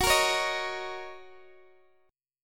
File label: Gb 6th Gb down Guitar Open D